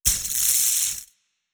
lightning muzzle electrical sparks, weapons; kaiju; Stellaris; biogenesis

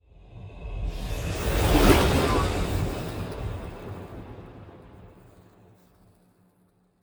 ship titan move; Stellaris; machineage; ships

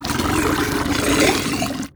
swarm generic idle; Stellaris; other entities

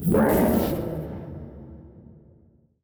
void worms nymph attack; Stellaris; grand archive; ships

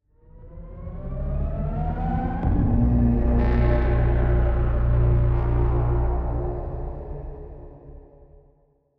ship cruiser move; ships; Stellaris; shroud

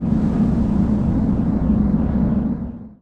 gas giant; ambient; Stellaris

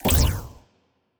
fire rate laser muzzle, weapons; Stellaris; biogenesis

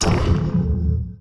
disruptor hit, weapons; Stellaris

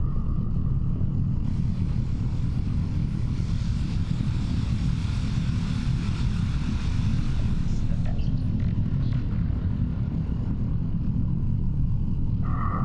ship science ship idle; Stellaris; machineage

